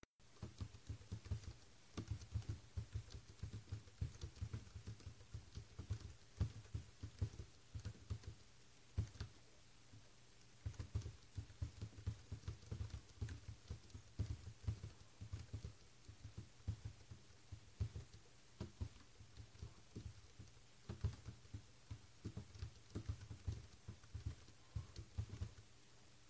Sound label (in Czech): HP elite, klávesnic